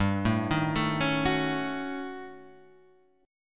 D sharp 7th Sharp 9th Flat 5th

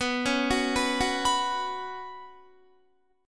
B Suspended 2nd, Guitar; Bsus2; arpeggiated

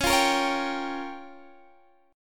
Db 6th Flat 5th Db down Guitar DADGAD

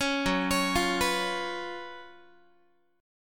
Gb Major 7th Suspended 4th Gb Guitar

